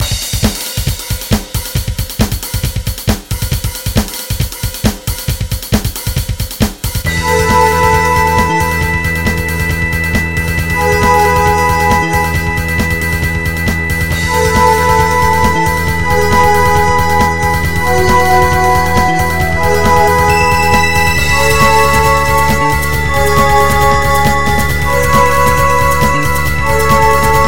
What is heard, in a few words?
Arranged Drum Bass Remix